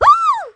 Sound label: fall; Ringling Bros. and Barnum & Bailey; Wii